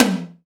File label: SYN TOM; Drum Hits